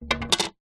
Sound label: wood pine plank short thin 40mm x 10mm set down wood decking; foley